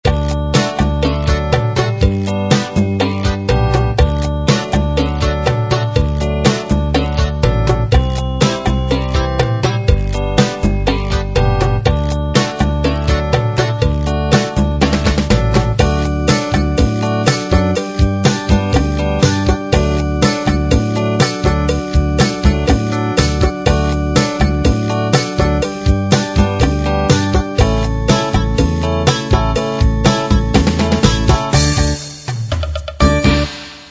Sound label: pop